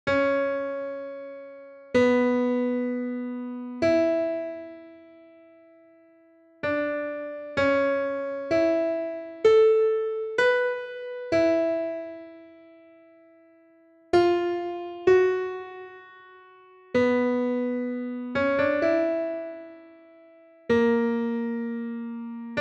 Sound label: Beethoven Piano; プロの音プロの技・令和版